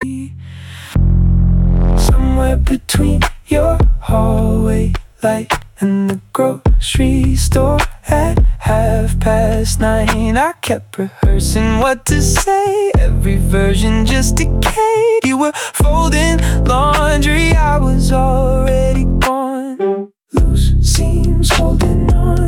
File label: hook mixed